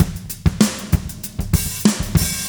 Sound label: Fast 16 096, Beat Loops; Zero-G; Monster Beats